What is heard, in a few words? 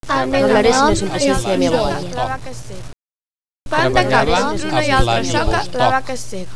Four Voices mix